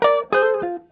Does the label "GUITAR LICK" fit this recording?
Yes